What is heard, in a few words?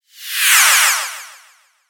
Descend, Delay, Spacey, High, Scifi; 5.1